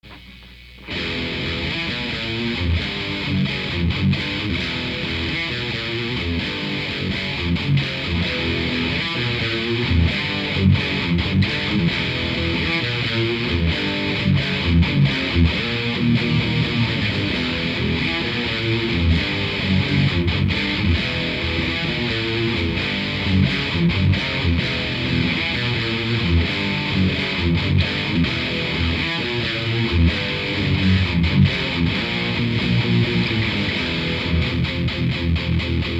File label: Riff Tapes; Kirk's Riff Tapes II